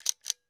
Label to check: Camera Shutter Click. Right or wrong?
Right